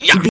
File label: voice male attack